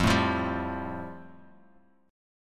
F Major Add 9th; Fadd9 strummed; JGuitar

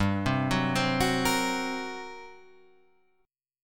G Minor Major 7th Double Flat 5th down, chord; arpeggiated; JGuitar

